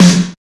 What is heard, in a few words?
SNR, Cheez Snrs